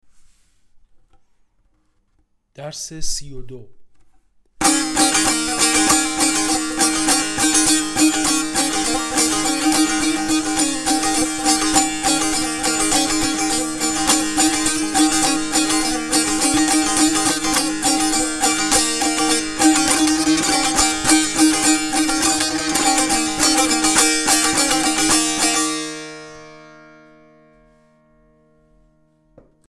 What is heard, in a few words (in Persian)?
مشق تنبور